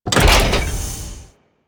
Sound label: Corpus Powerplant Jettison Door Start; Ambience; Warframe; Corpus Railjack; Tether Power Plant